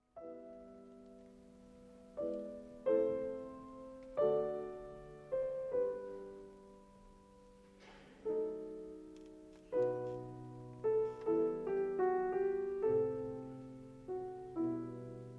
Piano Concerto in G major, Andante con moto

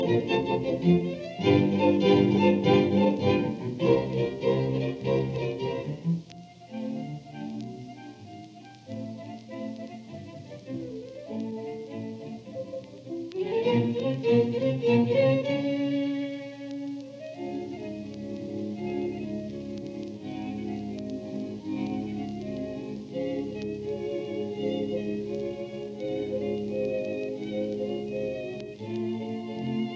Octet in F major D 803 III Allegro vivace; Schubert

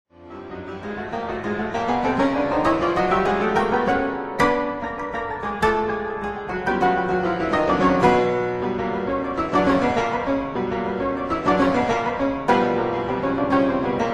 Etudes for piano in B minor

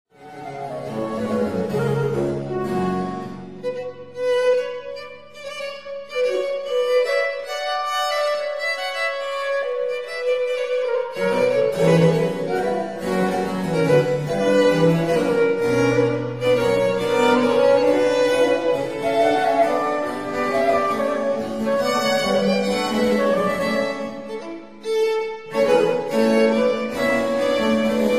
for 2 violins & continuo Gigue Lour; L'Espagnole (La visionnaire); Les Nations

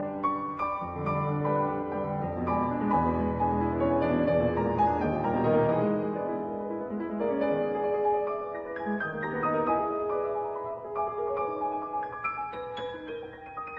Piano Sonata in F minor, Allegro con fuoco

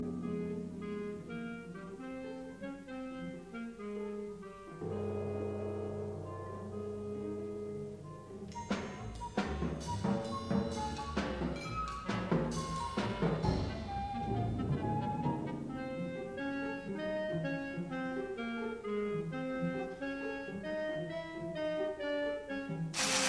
Percussion Concerto; milhaud; Op 109

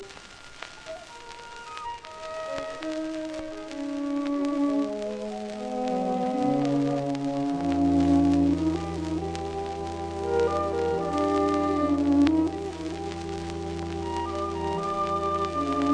String Quartet Entruckung; Op 10; Schoenberg

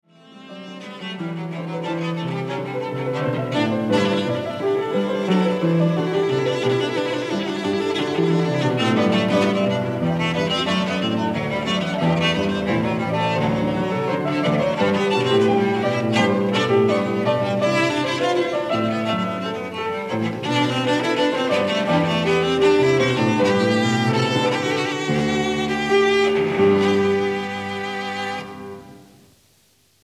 Sonata for Cello & Piano in G; IV. Allegro; J.S. Bach

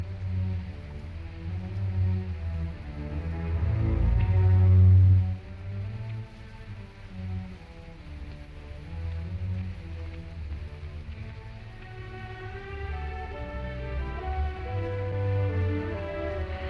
Symphony in D minor Choral Finale Allegro assai; Symphony No 9; Op 125; Arturo Toscanini

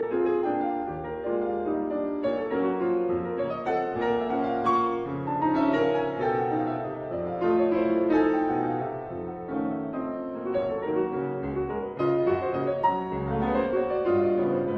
Waltz in A flat major; Chopin

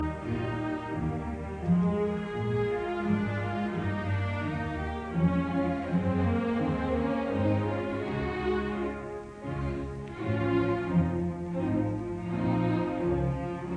Symphony in C minor Andante moderato; Symphony No 2; Resurrection; Bruno Walter

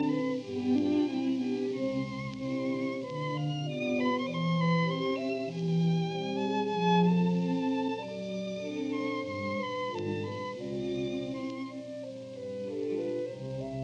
Octet in F major D 803 IV Andante; Schubert

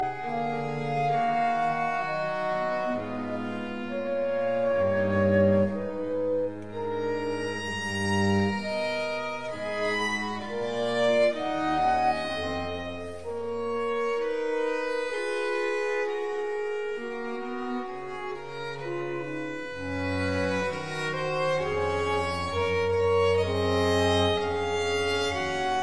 Quartet Adagio; Quatrieme livre de 6 quatuors; No 5 in G major; TWV 43G5

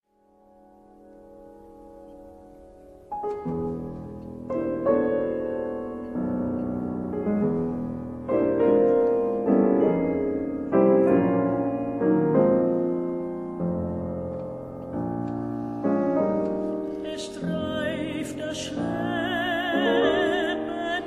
A Winter's Tale, for voice & ensemble; Wuorinen

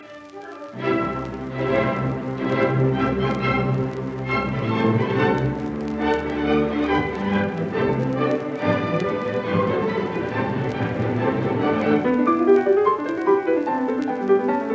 Piano Concerto in G major Rondo Vivace; Op 58; Beethoven

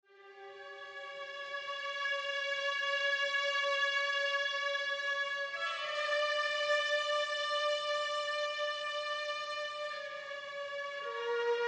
Symphony in F sharp minor, Adagio; Symphony No. 10; incomplete

